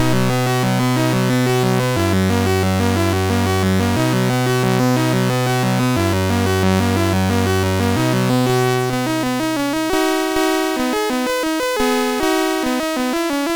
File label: Digi Hits, MOS6581R3